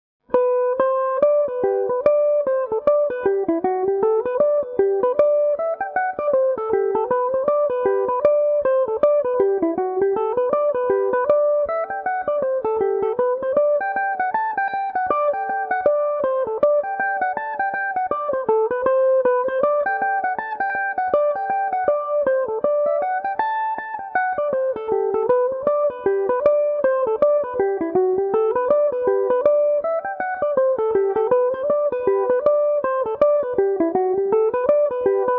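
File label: G major, Irish mandolin; kittys gone a milking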